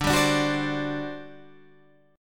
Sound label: G Major 7th Suspended 4th, D